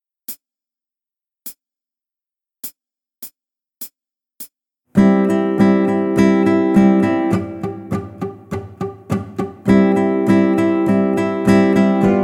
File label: groove